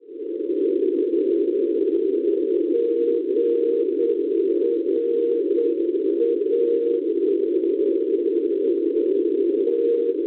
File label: NDB 343 kHz; GRA; Grazzanise; Italia; Schwarzsee